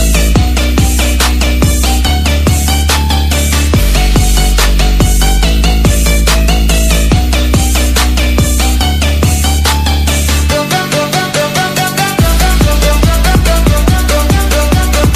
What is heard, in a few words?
english music ringtone